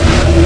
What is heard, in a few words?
pump